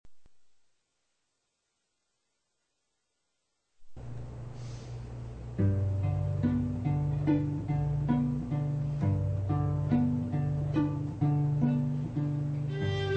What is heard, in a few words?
trio